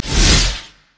hammer smash; LoL; taric

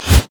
mana flare

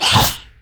spectral basic attack; LoL; yorick

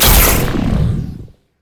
q explode; LoL; velkoz

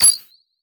microrockets; LoL; heimerdinger

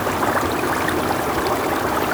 lava cauldron loop; emitters global

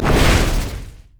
dragons descent; LoL; shyvana

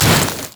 frost scorched earth; LoL; shyvana

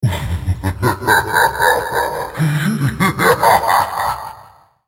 laugh; HecarimArcade; Character Voices - English

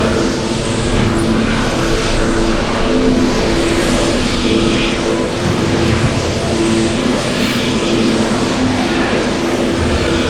speedshrine loop; LoL; altars

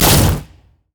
deadly bloom; LoL; zyra

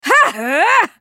spell cast effort; Karma; Character Voices; English

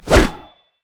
basicattak; LoL; fiddlesticks

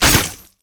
ranged attack; LoL; jayce

